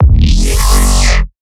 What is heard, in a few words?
Growl Bass; neuro; BIG5AUDIO